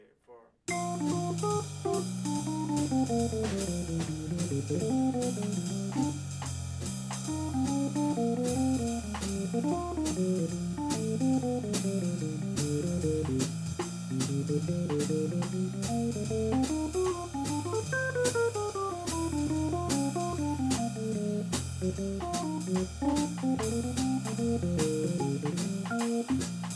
jazz Not Swinging

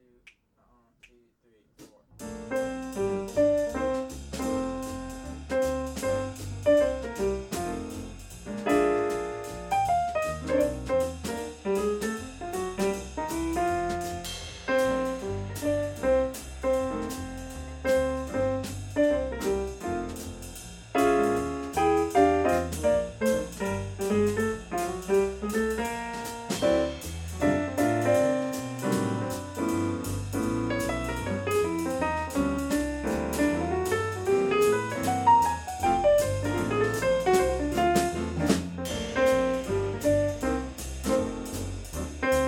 jazz

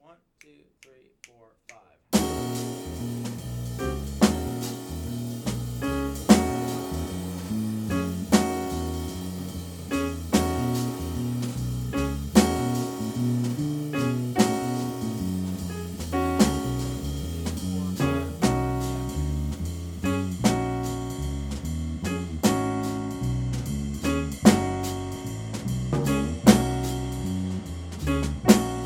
jazz Bass Solo

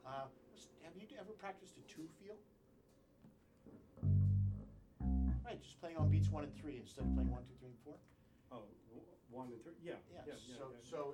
jazz Solo

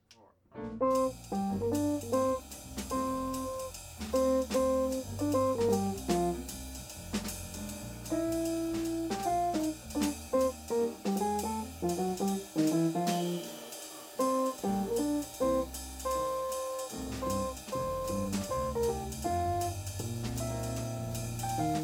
jazz

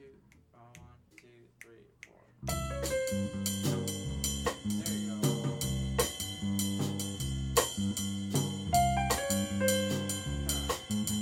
Piano Solo, jazz

